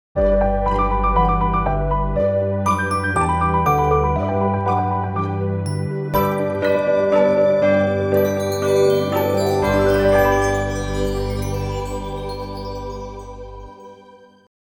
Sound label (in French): Noel jingle; Jingles medley